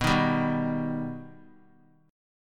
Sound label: B Augmented B, chord; strummed; JGuitar